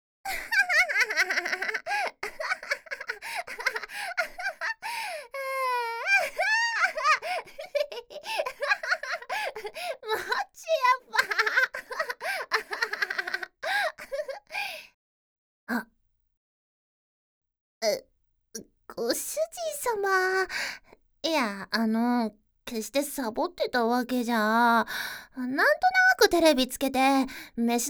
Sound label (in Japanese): 超下品で激しいバキュームフェラ; エロ重視